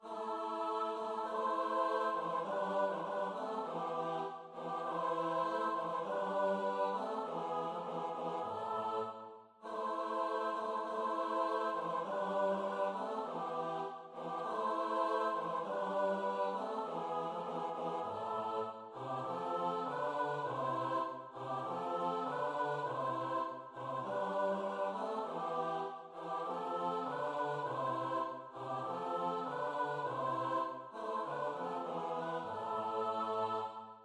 CHOIR

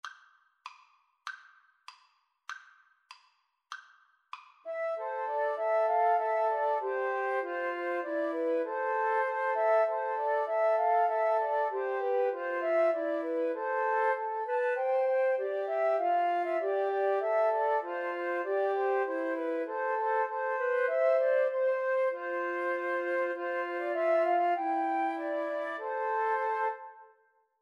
huron carol, Flute Trio

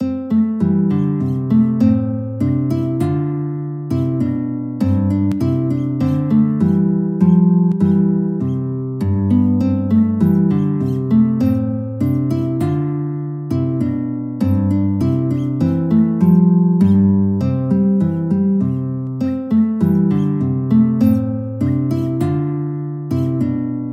The Singing Bird guitar

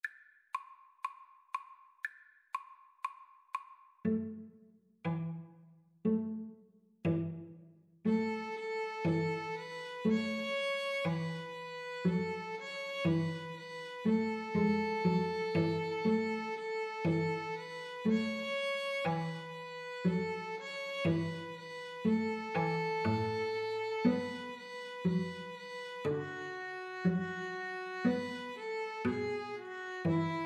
String trio

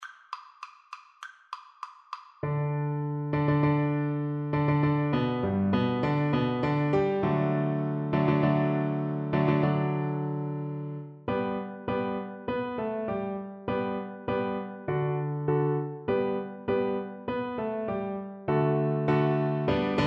horn, French Horn

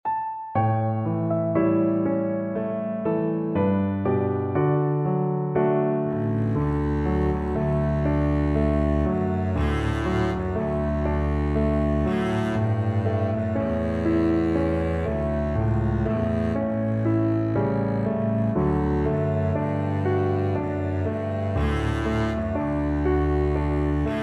avondale, Double Bass

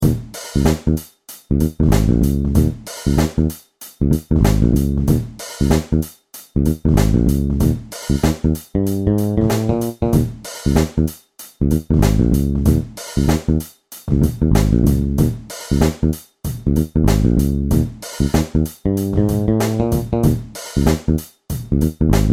bass guitar

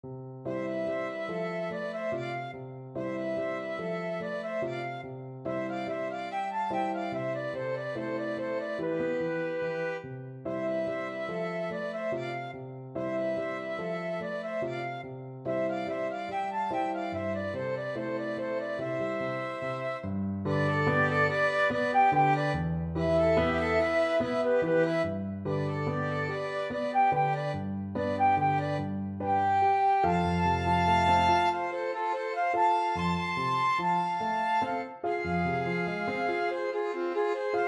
flute violin duet

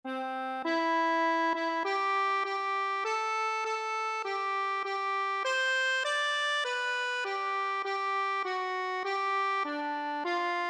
Navy Hymn, accordion

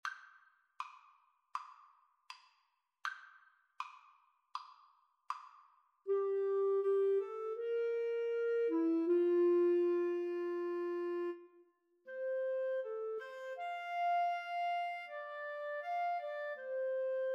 clarinet horn, Clarinet-French Horn Duet; farewell song

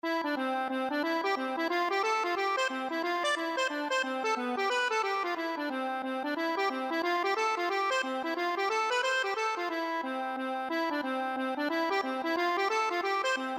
hornpipe, accordion

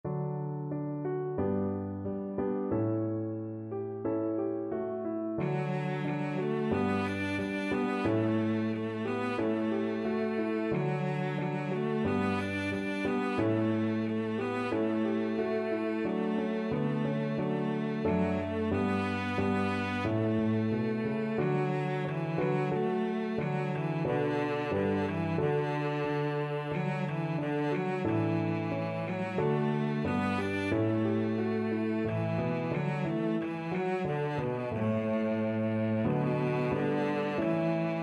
cello

